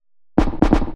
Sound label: HOUSE EXTRA 125